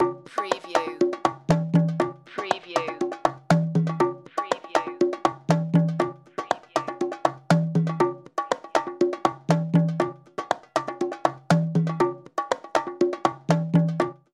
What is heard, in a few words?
Musical; production element